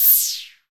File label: HH, HH Synth